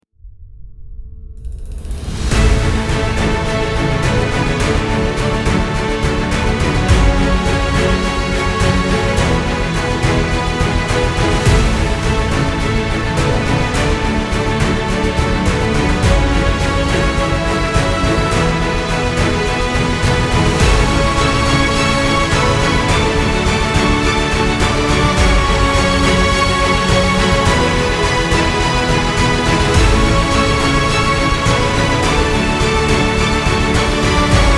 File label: Inspiring